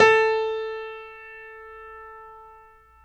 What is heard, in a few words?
PNO Grand Pno; Club-50 - Foundations Roland